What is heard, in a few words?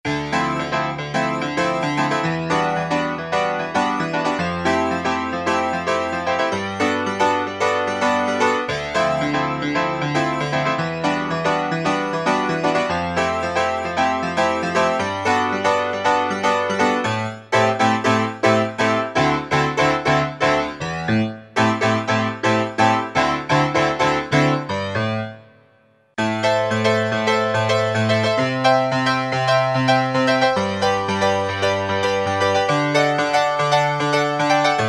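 keyboard Brite Piano; E-mu Proteus FX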